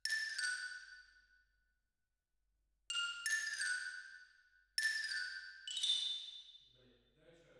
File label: Twin R Xylo